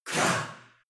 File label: choir voice male whisper